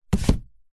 household book large thick set down on top of another; zapsplat